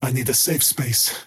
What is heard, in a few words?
crown teleport pain; skywrath mage